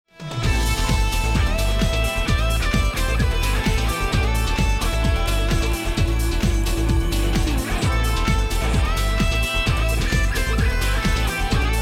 won round; music kits custom

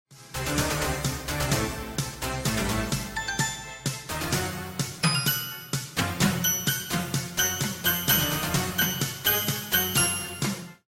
bomb ten sec count; music kits custom

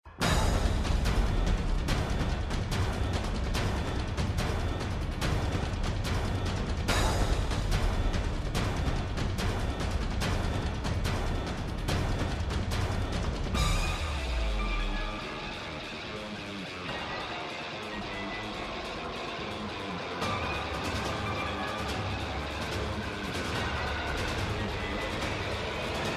bomb planted; music kits custom

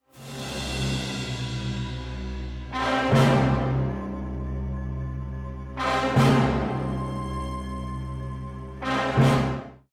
round ten sec count; music kits custom

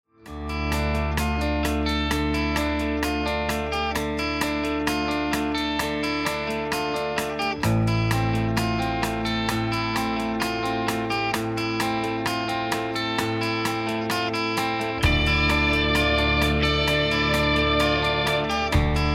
bomb planted; music kits custom

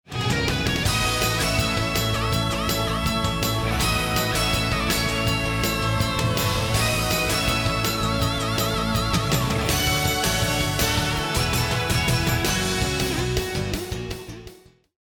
start action; music kits custom

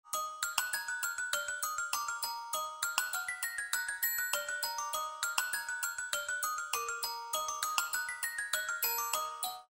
round ten sec count; music kits custom

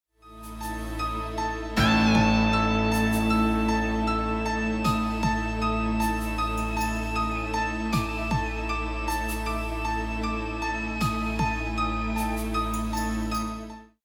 lost round; music kits custom

